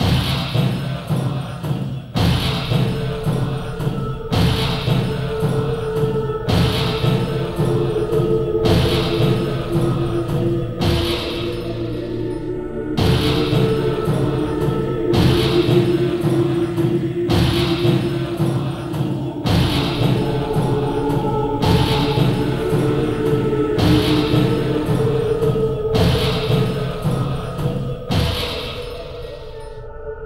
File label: Worshipper Chant Norse; Black & White 2